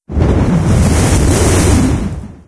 stereo gust; ambient, ambience, rainscapes, rain

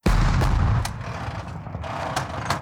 artillery unit fire; Hearts of Iron IV Death or Dishonor

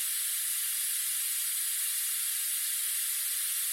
gastank; ambience; AssaultCube

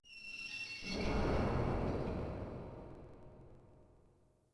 Space Crate Fall; Ambient; Sid Meier's Civilization 4 Complete; Beyond the Sword

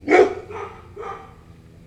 dog bark close; ambient; creatures; Counter-Strike Global Offensive

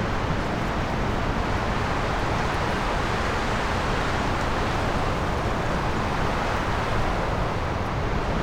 desert med wind; ambient; Counter-Strike Global Offensive

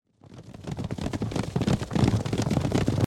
Horses; Age of Empires III

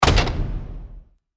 lock in champion; League of Legends; lol air client

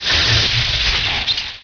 worm sign elec; Emperor - Battle for Dune

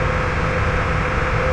outdoor, atmosphere; Garry's Mod; hl2

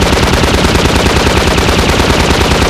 gunship weapon fire loop; npc; Garry's Mod; hl2; combine gunship

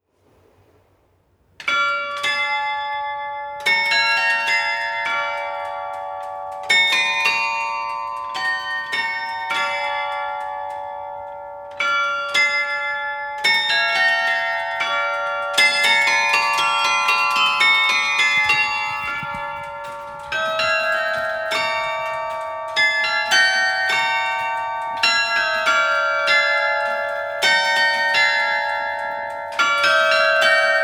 Carillion, Improvisation, Mechanical Instruments; Speelklok Museum

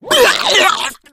female boomer vomit; voice; Left 4 Dead 2

